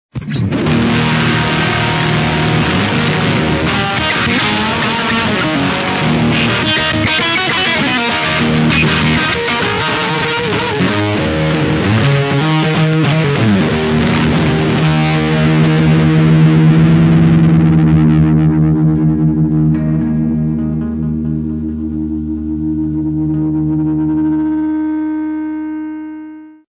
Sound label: fuzzzzz